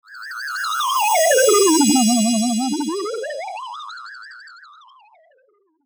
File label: SHIMMER, Shimmer Metallic Warble; Blastwave